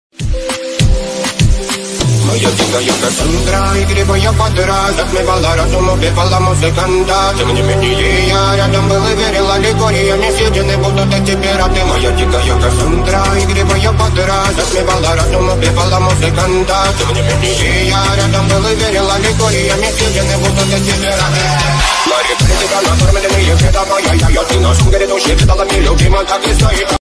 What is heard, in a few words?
best remix ringtone english ringtone